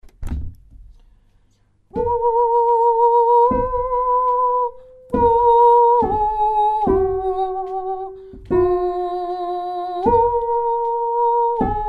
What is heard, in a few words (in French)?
mezzo